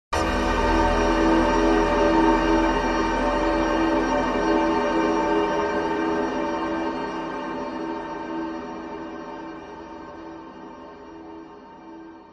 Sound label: sound design cinematic hit bright airy clunk